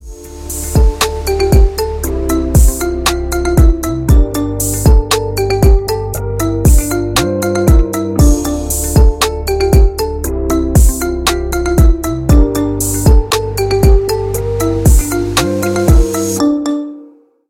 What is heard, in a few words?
marimba remix